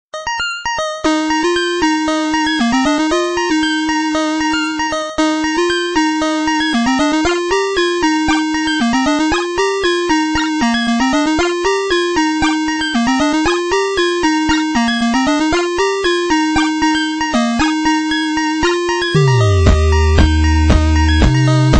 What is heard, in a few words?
8bit